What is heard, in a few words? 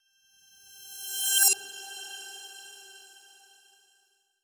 fairy sparkle whoosh; mixkit